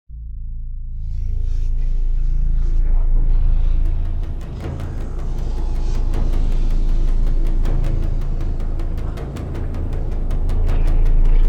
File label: orc mine explore day